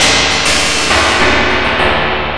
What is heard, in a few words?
PERC FX, Big Beat; Big Beat Samples & More; AKAI